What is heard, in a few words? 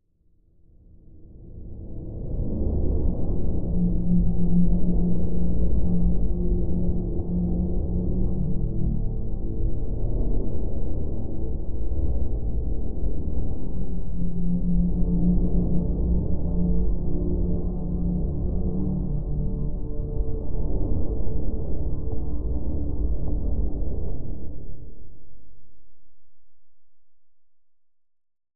Music Eerie Low Tone, pads